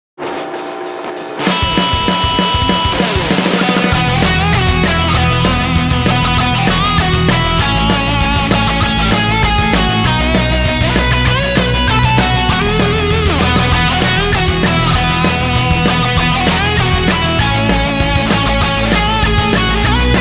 heavy metal christmas

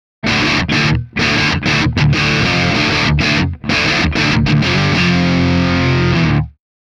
guitar bridge

